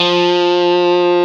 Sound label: GTR Tube, Dirty; Club-50 - Foundations Roland